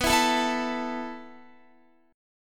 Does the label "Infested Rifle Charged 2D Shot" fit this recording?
No